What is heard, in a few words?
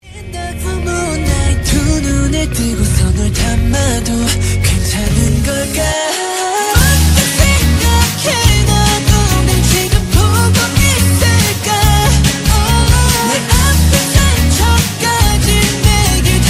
Korean ringtone